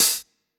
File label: short cymbal